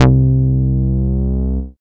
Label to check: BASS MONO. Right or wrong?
Right